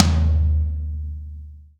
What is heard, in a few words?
drum hit clap